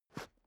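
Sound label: step boots concrete slide short, footsteps; menschen; schritte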